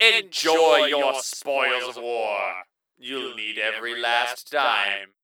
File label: MvM Wave Complete; Gray Mann